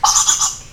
Macaca nigra

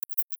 Nyctalus leisleri, batcalls, europe

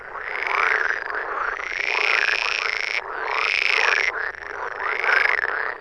frogs

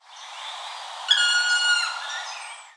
Dryocopus martius

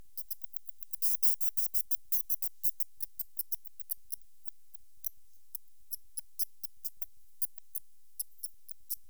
Myotis bechsteinii

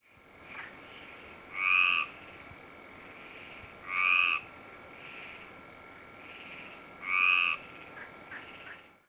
Hyla meridionalis

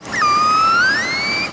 killer whales calls and clicks; heike vester

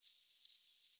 Sorex araneus

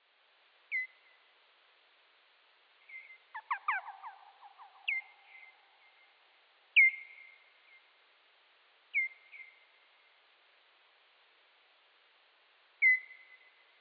pipistrelle

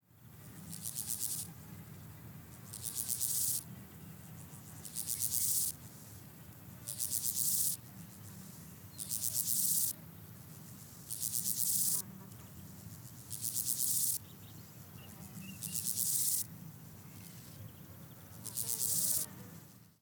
chort dorsatus

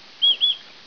rat

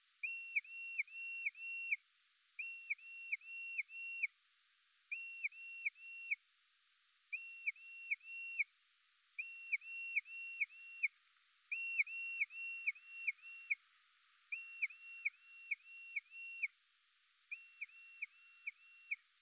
Rhinolophus mehelyi

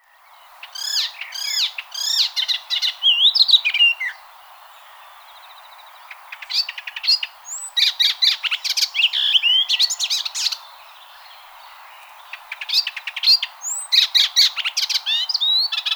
Hippolais icterina

